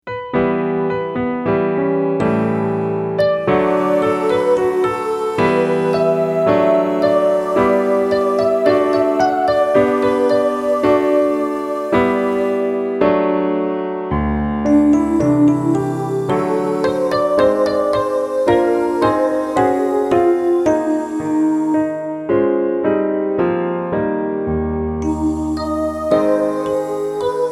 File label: Piano Study